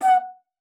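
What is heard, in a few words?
F#3, bass flute; Xsample VOL-2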